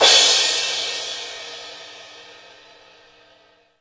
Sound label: cymbal sample a key